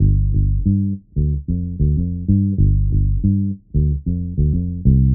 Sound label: Bpm 93 G Funk Bass; Basslines